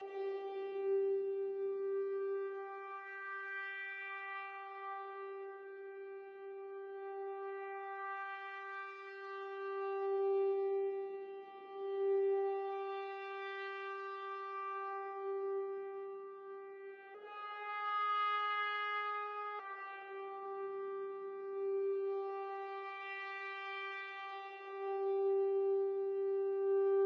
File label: pendulum loop